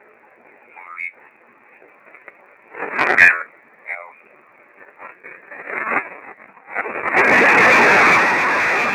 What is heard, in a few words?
loud basement